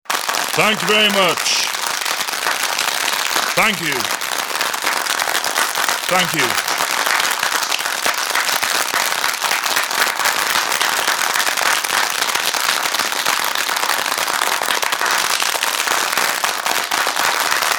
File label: Performance Close, Audience enthusiastic applause with performer thanking them.